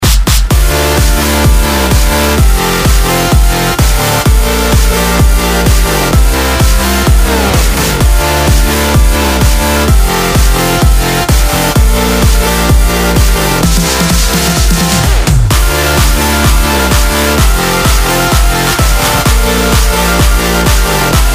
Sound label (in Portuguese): trilhas dance